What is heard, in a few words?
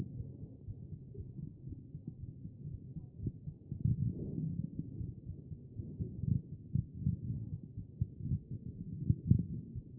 A CHEST; B MODE